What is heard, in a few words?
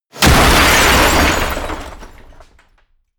smash, baugewerbe